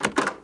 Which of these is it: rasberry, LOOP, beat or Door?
Door